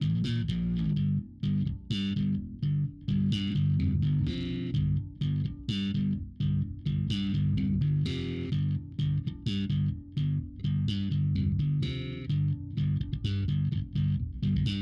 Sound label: Bass Reamp